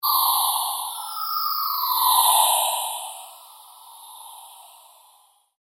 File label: PULSE LONG SWEEP